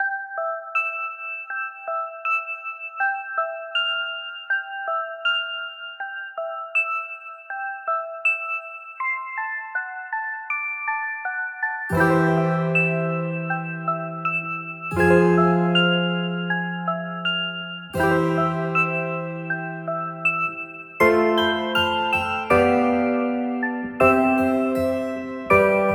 Sleeping song Music for healing with beautiful music box